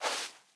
Sand Foot Step; blue archive

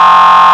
horn, sirens; federal signal pa 640

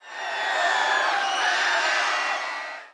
Crowd; blue archive

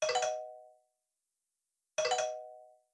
Ring; blue archive

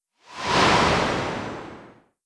Strong Wind; blue archive

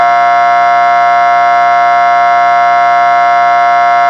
horn, sirens; federal sig ss

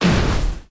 Fire Appear; blue archive

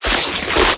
Tear Doll; blue archive

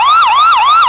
yelp, sirens; gta

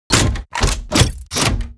Heavy Tank Railgun; blue archive

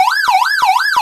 yelp, sirens; galls street thunder 800

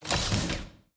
Occupy Upgrade; Conquest; blue archive

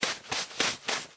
Sand Run; blue archive

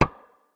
Knock; blue archive

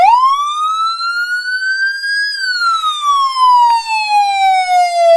wail, sirens; galls street thunder 800

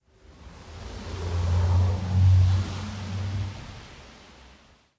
Bus; blue archive

